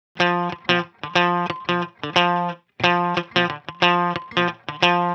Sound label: 090 NuJazz Guitar Gmaj, Jazz Guitar Loops; Pro Tools Essential Loops; Digidesign